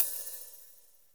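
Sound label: hi-hat, drums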